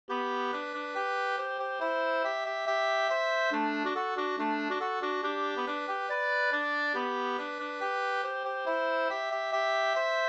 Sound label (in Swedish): schottis G dur